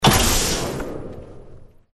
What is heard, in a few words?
Jump Pad